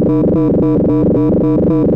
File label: Moog G1, Synthesizer; Hollywood Edge; Giorgio Moroder Rare Synthesizer Collection